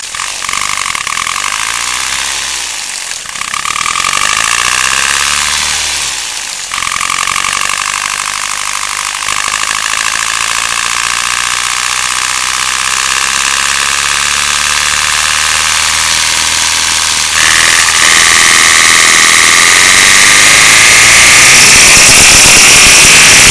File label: knock